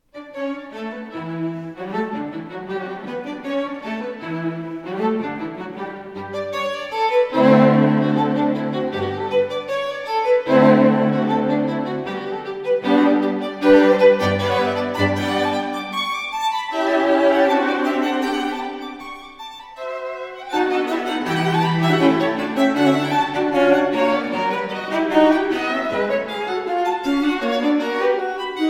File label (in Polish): haydn kwartet smyczkowy g dur finale presto